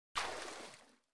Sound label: Swimming Arm Water